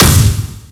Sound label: Downward Thrust Miss; Link hitting the ground with his Downward Thrust (also used by Toon Link)